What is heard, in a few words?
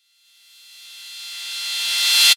Riser